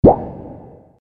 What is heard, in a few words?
bubble